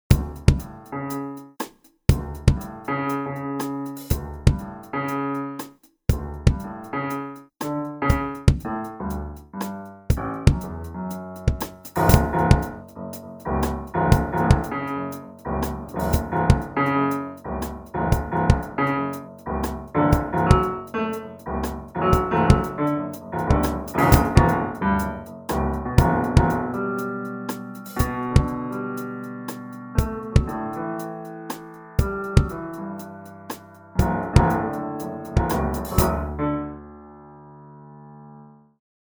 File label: Improvist